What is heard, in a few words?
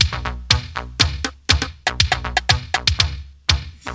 clanky, audioloops